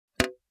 Single water drop empty plastic bucket

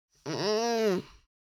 Discomfort moan teenage girl

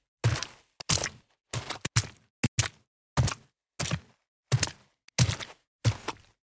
Footsteps on mud shoes walk

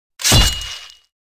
Battle axe body hit

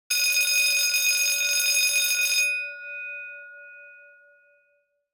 High school bell ringing close perspective

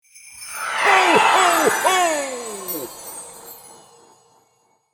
Santa claus magical laughs; festivities

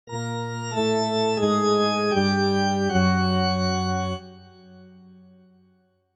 Cartoon church organ

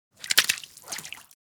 Casting the fishing rod into the water

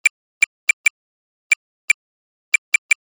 Military radar antenna signal beeps; Military Equipment

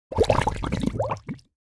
Cartoon bubbles

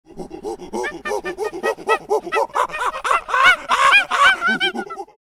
Apes chimpanzees calling out

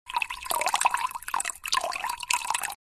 Liquid water pouring in a glass

